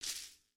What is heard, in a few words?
Foot Grass Walk; footsteps